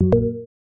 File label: user join; fluxer